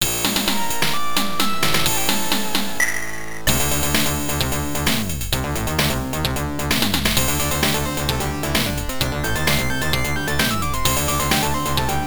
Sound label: Game Start BGM; kozure ookami arcade